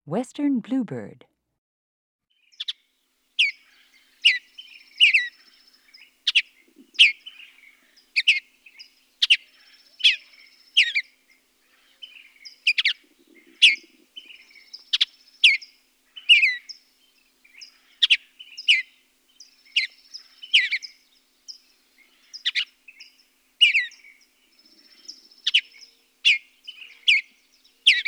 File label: Western Bluebird